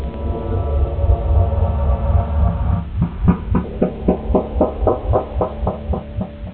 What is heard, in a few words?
Ressurect; Mythos